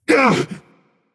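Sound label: non verbal ouch, voice; cernunnos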